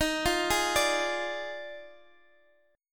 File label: Eb Major 7th Suspended 2nd Suspended 4th, Guitar DADGAD